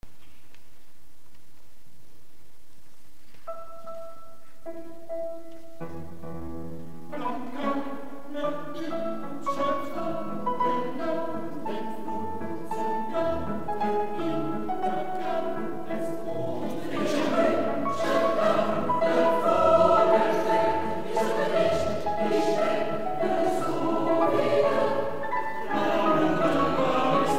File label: Brahms Liebeslieder piano